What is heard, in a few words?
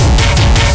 drumloop; awe32